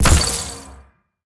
mag spark

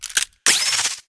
uav bomb

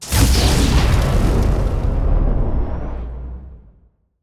lightning

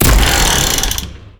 big bow

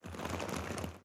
sweep tail

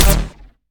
dartpistol

